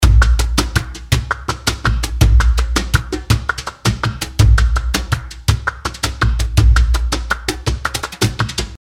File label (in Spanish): Tanguillos BPM 110